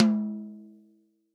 TOM, Real Drum Kit, POP KIT; Best Service ProSamples vol.43

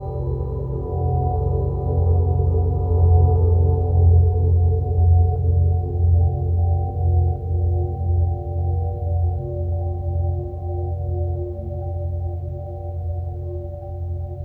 ambience

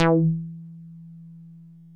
MOOG1 BASS E3

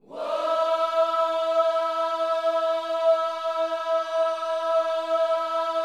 WHOA, Gospel; Spectrasonics Vocal Planet

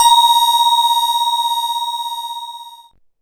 BELL-GONG, A#; Big Fish Audio

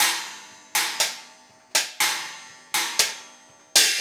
Cymbal Pattern; Sonic Foundry (Sony Creative Software) - Essential Percussion

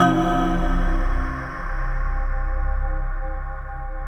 BELL, Synthesizers; SYNBELL1 QC1

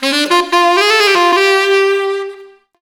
Sax Funky; Sonic Foundry (Sony Creative Software); Knutrix Drum & Bass

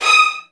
VN FX, Virtuoso Strings

